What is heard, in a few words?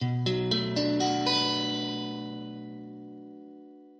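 B min, Guitar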